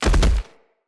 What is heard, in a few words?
Stag Gallop Vegetation, Footsteps; Animals; Foley